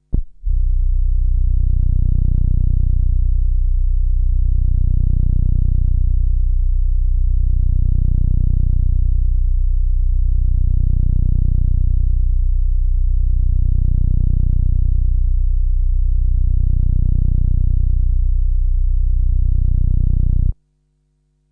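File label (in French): bubble bass, infragrave nappes statiques, Attention départ sec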